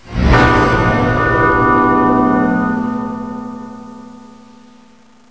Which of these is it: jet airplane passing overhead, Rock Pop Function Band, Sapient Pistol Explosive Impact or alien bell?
alien bell